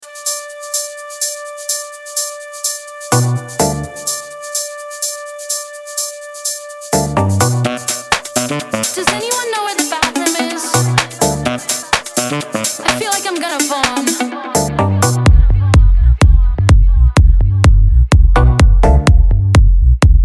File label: 10A 126 Deep Club House